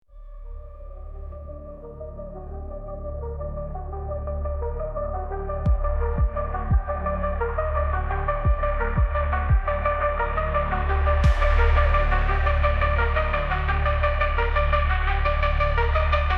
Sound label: epic synthwave combat music